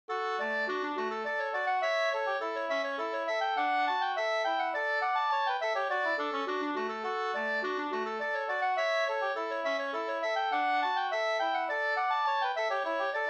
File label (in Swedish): slängpolska